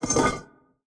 urn large close; skyrim

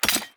weapon up; skyrim

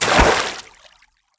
water sprint; skyrim

